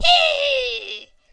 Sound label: silly hooooo, Voice; single hits